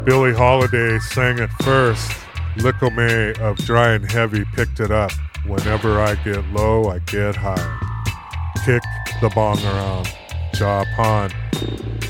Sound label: kick bong